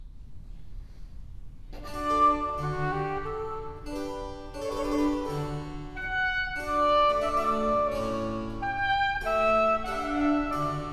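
Sonata for Oboe and Basso Continuo in G minor Largo; RV 28; Antonio Vivaldi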